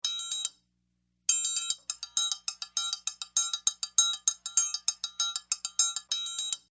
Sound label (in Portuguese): TRIÂNGULO